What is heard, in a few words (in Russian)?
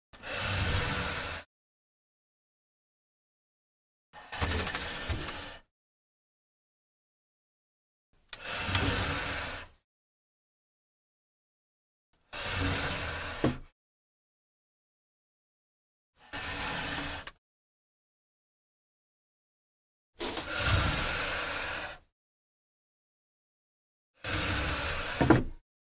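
звук передвижения стула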